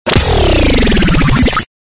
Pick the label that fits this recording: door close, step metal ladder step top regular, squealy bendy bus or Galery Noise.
Galery Noise